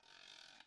guiro long; Struck Idiophones

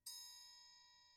triangle hit mp

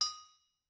Anvil Hit, Struck Idiophones

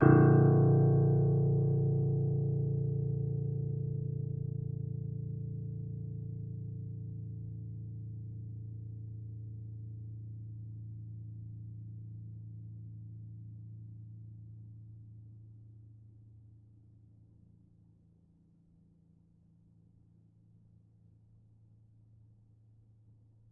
Upright Piano, Sustains, A1; Chordophones; Knight

